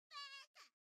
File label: Sheep Baka; Basketball